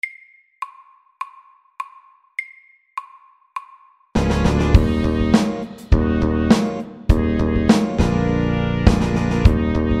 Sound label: Funky, 102